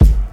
modern dry kick g sharp key, kick drum tuned to the G# note. Loudest frequency: 170Hz